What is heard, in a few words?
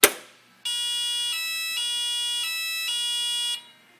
Forklift Error Code